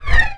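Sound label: teleport; Unreal; Firestorm 2014; FSPack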